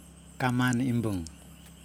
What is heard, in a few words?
small bamboo drum; Yokoim